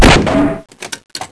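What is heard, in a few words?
grenade launcher, weapons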